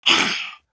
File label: Monster